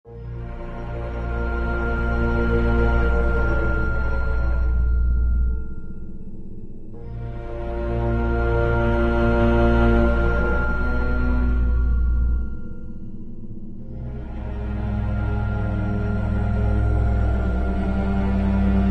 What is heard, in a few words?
Cautious